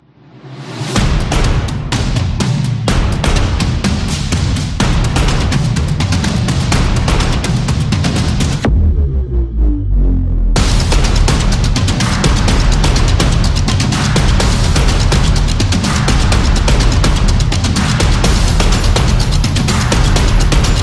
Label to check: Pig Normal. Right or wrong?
Wrong